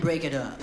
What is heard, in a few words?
VOC